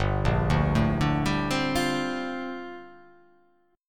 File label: G Minor 11th, chord; Gm11 arpeggiated; JGuitar